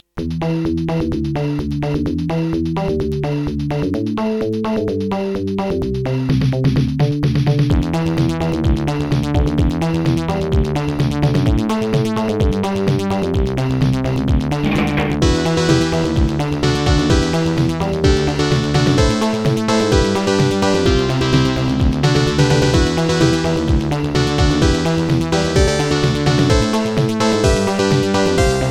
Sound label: piano